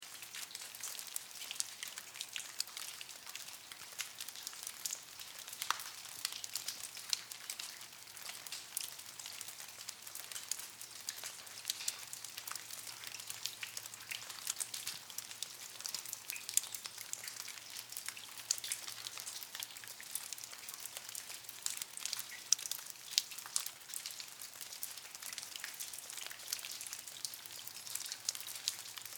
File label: Ambience Cave Water Drips Loop; Nature